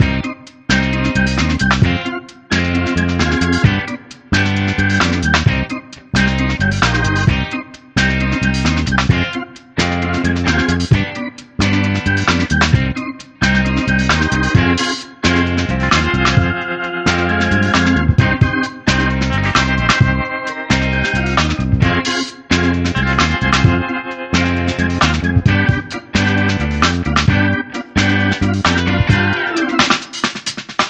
Music; cartoon network punch time explosion xl 2011 gamerip

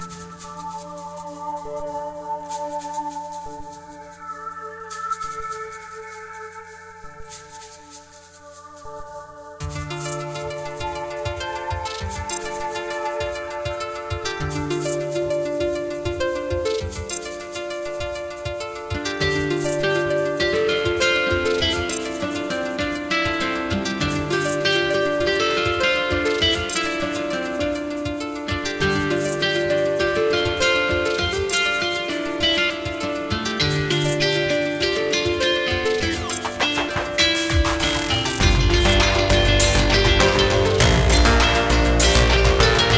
music forest ambient; red goddess inner world windows 2015

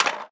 Kart Hit Wall; pac man kart rally android

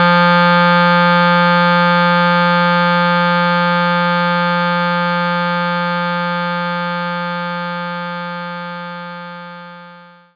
clarinet F3; knights try

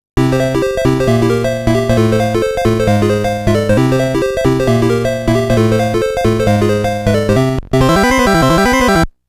Bonus

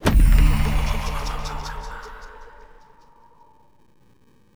Push Notification; Gangstar New Orleans

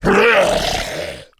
Creatures Fight Death; mighty party 2017 gamerip pc ios android

